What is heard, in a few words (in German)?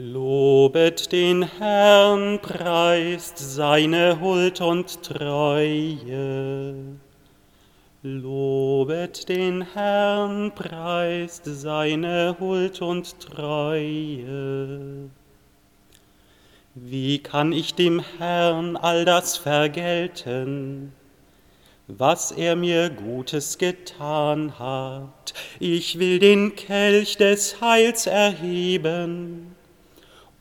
Antwortpsalm Gruendonnerstag; pfarreienverbund am limes